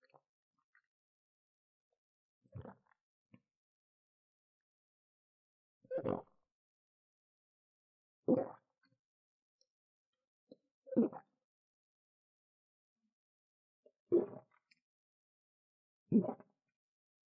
吞嚥_正面; 吞嚥音

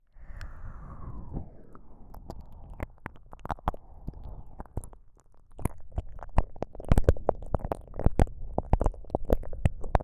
KU100 左耳 最奥舐め

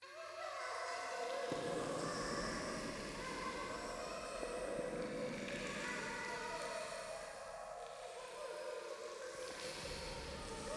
ゴースト 可愛い喘ぎ 触手

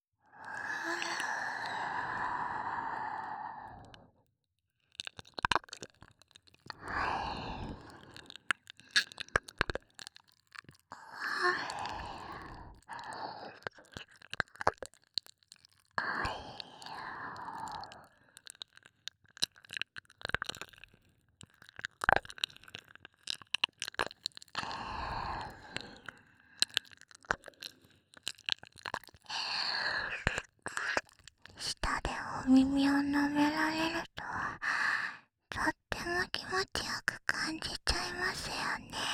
耳舐めパート, ASMR

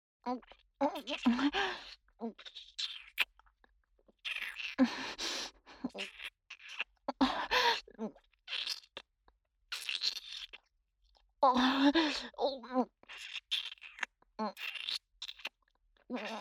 キス、乳首舐め, ASMR

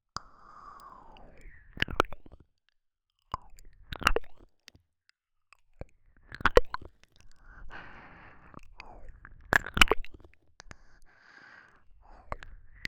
耳舐め アーカイブ

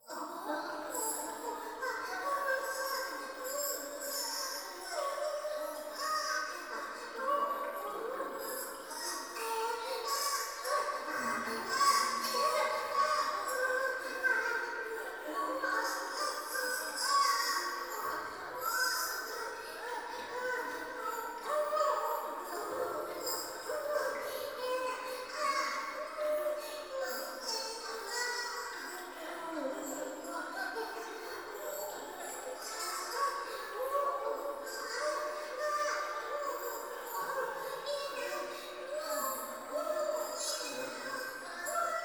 トイレ 低音喘ぎ 川のせせらぎ

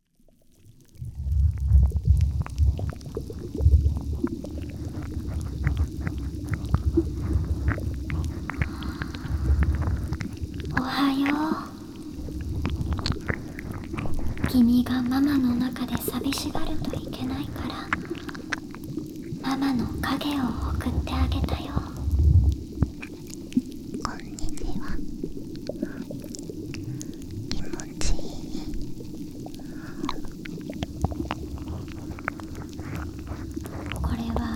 耳舐め＆耳ふー; 子宮内耳舐め＆耳ふーサンドイッチ

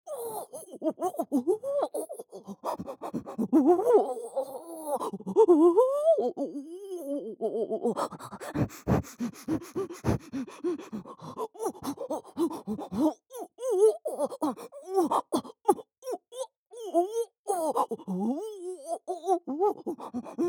喘ぎ声・激しい; マリエッタ

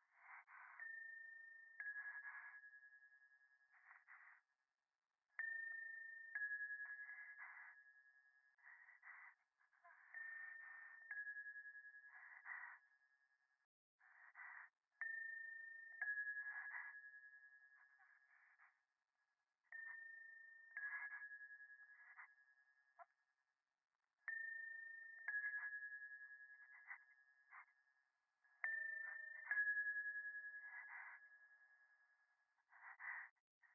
電話 左耳密着吐息 神社

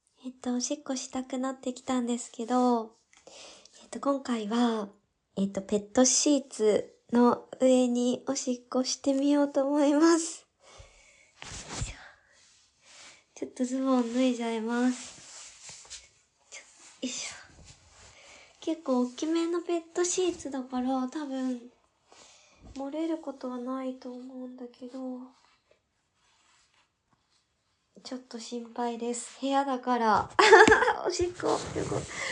お部屋で！床に！ペットシーツに！オシッコですッ！

